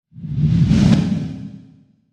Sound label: delay woosh